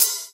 clear urban open high hat sound e key, Royality free open hat sample tuned to the E note